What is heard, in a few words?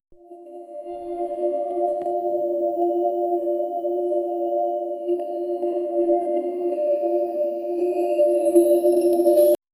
gentle angelic, celestial